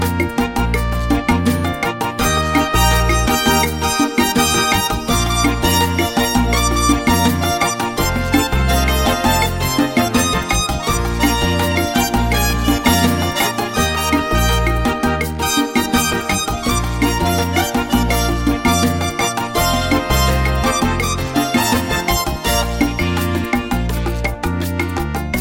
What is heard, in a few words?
Sabor